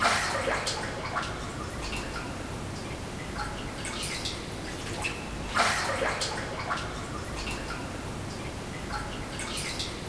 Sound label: Bathtub Sounds; Inazuma Eleven Everyday; 3DS